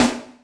snaredrum, Yamaha RM 50

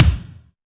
Kick Ambient

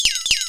Flexttone, Korg M1; Drum Samples

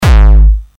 HardCore Kick

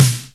Snaredrum, Roland D-70

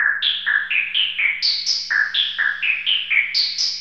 Noise Shot Loop; Sound FX

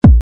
kick

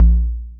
kicks, Bass Drum

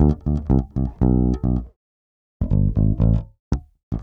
Descending Fingers

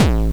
bombo, kicks, Hardcore

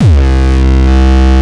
kick; shock. Ultimate Kicks vol. 2

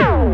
Hard Kick

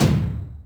Yamaha RM 50 Toms

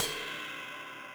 CYMBAL, Yamaha RM 50

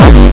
Bombo Hardcore, kicks

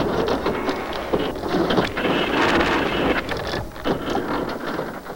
Wooden machine; Sound FX

